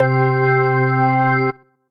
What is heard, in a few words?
Organ Tonewheels; Joyous; Electric Keyboards; Ableton; Factory Packs